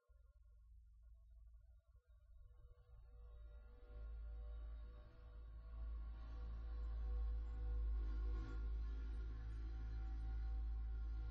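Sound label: Audio Drone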